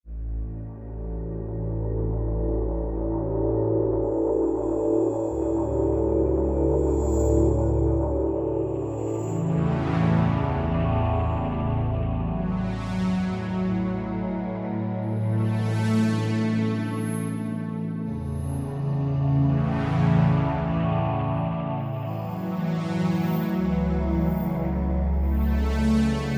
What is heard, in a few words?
Revival Drone Full